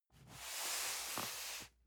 cardboard box medium slide soft; objekte

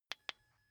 car key button click signal quick

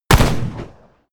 revolver close shot; schusswaffen

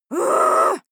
female shout upset; menschen

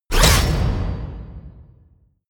promo pad; whooshes-rises; hits-impacts

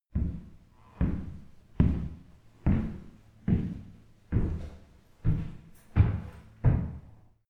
step shoe on wood

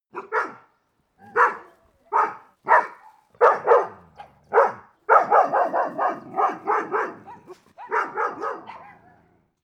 dogs neighborhood bark; natur-tiere; hunde

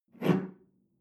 espresso grab tablecloth slide

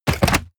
mechanical lever wood small up; industrie-wirtschaft; mechanik

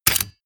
mechanical switch metal small push; industrie-wirtschaft; mechanik

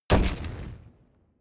wood stack

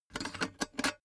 cash box metal lock; maschinen; soundpool

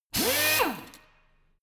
impactor high; maschinen; bohrer

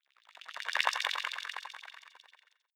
sci-fi subterranean light up; swooshes-swishes

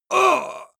male combat hit hard

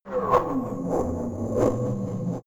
huge weapon loading; digital

